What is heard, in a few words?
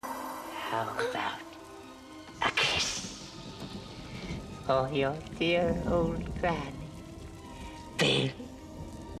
kiss